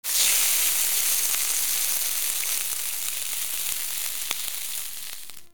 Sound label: Cooking Sizzle; Ambience; Props; Warframe; TheNewWar; Zariman